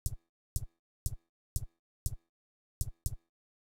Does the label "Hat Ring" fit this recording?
Yes